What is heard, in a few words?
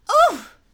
ouch; rapidragdoll; foxie